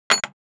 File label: drop back shuffled, small glass flat coaster